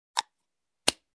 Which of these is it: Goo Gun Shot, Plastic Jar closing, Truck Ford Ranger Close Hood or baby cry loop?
Plastic Jar closing